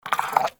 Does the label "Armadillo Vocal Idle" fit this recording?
Yes